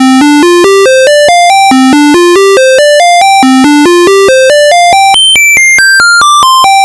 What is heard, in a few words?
NES Synth, chiptune